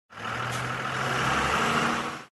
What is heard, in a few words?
school bus short